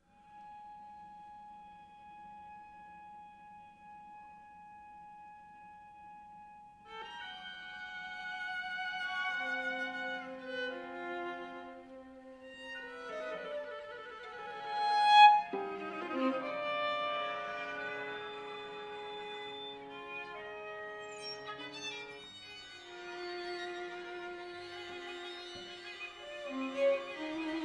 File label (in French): Quatuor; Boulez; Livre Pour Quatuor Révisé